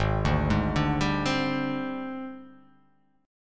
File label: Ab Major 7th Flat 5th Ab, down; arpeggiated; JGuitar